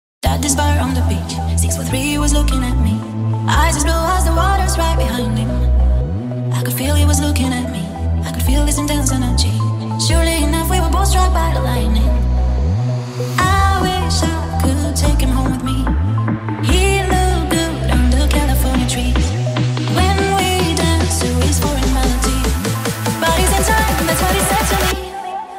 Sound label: Techno Version